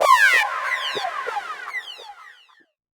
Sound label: Zap, PsyTrance